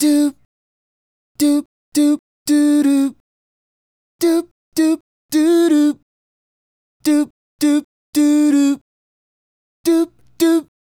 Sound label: Male Solo, Hip-Hop and RnB Vocals